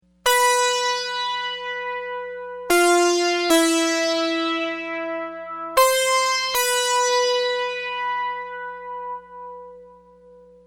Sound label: Heads Ringin